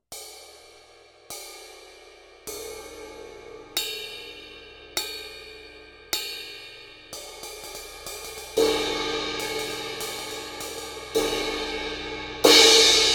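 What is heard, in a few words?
Crash Byzance Traditional Medium Thin 18, cymbales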